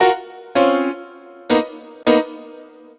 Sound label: Piano Jazz like, Drum & Bass Keys; Sonic Foundry (Sony Creative Software); Knutrix Drum & Bass